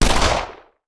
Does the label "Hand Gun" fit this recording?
Yes